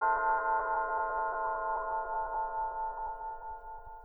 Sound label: bells test